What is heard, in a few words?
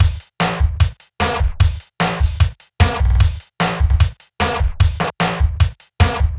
Grunged Break 150 BPM